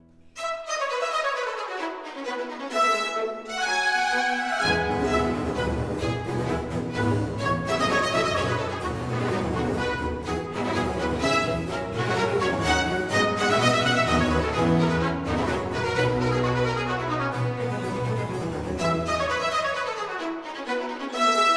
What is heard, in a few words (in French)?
Bruit de tonnerre; Hippolyte et Aricie; L Orfeo Barockorchester